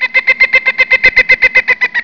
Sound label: Jungle Bird; psion cd vol1